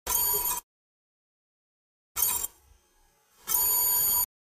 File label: Highpitched Whir, computers